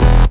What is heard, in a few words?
Synth Shot; Vengeance Samples